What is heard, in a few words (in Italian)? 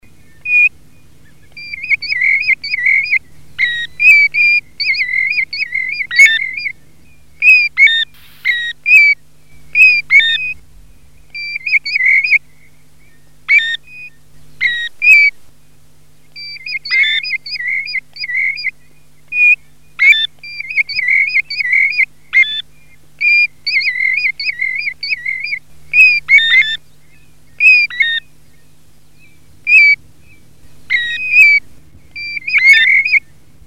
PIVIERE DORATO; CANTI SAG NATURE 2024